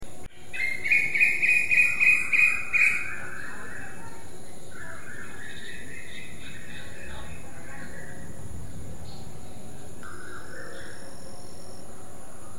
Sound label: Koel